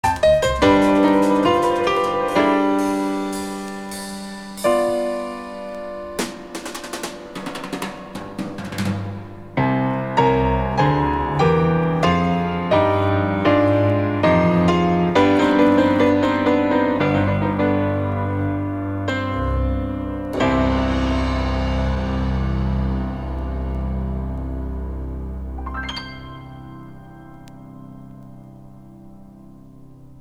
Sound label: solfeggio 1200 S